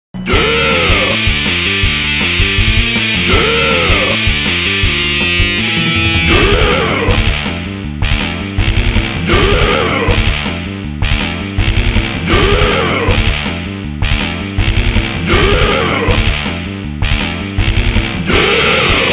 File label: Metal Steroid Pony